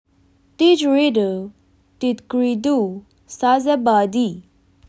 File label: didgeridoo